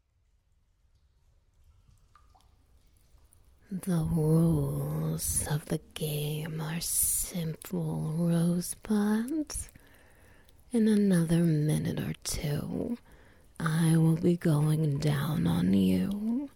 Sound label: ambience; Lamia Head Games